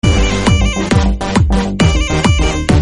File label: dubstep mix song notification